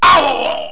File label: IMP HIT; JOHNNY